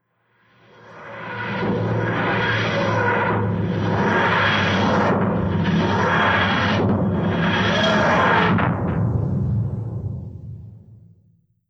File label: mat fast, tardis; p00gie